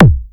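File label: bass drum electronic click; 300 Drum Machines; Yamaha Motif ES-Series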